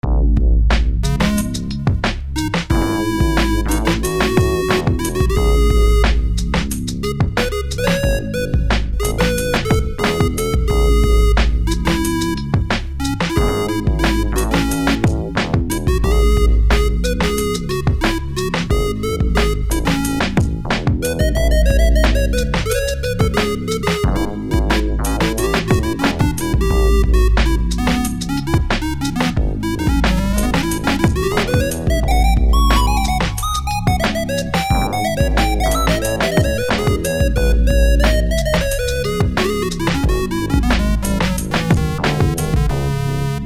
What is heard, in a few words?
RINGTONE